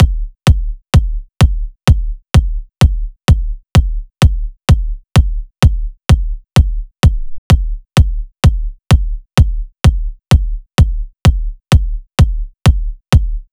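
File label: Loop 128BPM bass drum; VEC5 Drumloops; VEC5 Multi Loops 128BPM; Loop Elements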